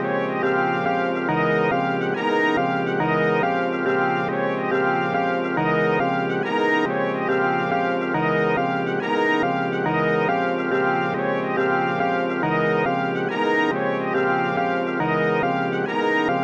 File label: Loop A# 70 bpm; 99Sounds; Mangling Audio; Mangled Software; Midiception